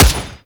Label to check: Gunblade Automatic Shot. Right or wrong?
Right